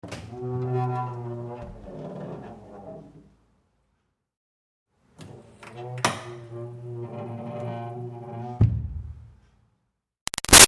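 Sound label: Door Wood Push Bathroom Groan Open Close Medium; Miscellaneous Doors; Door Wood Push Bathroom Groan O-C Med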